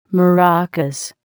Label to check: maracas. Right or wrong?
Right